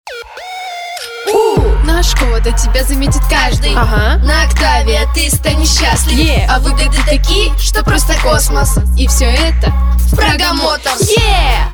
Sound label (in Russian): vokal